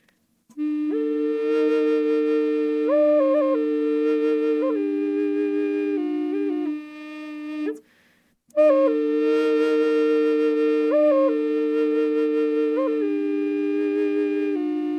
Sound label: Eb no effects, drone flute